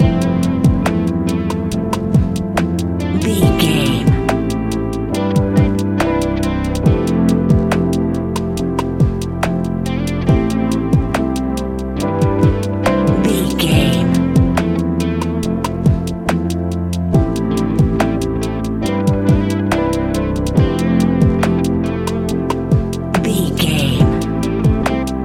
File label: groove, A relaxed and chilling piece of music